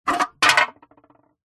foley drink can empty crushed set down on concrete